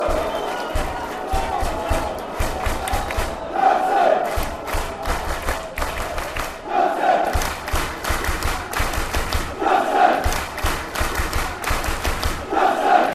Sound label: county clap